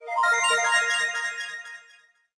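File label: correct object click; Dark Dimensions: City of Fog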